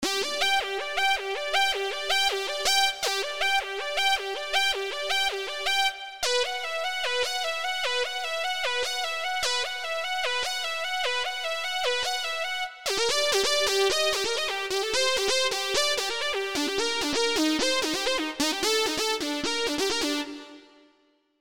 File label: saw; La Nouba